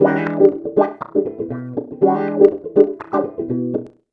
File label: 120 WAH WAH, Electric Guitar Loops; USB Soundscan vol.04 - Electric & Acoustic Guitar Loops; AKAI